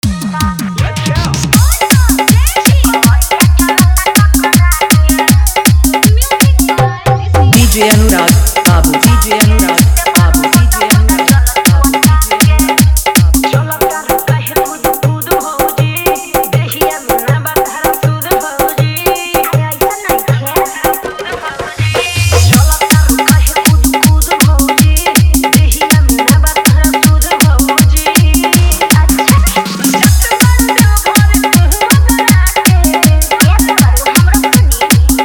Bolbam New Bhojpuri Viral Hot Dj Song Fully Dhollki Bass Dance Mix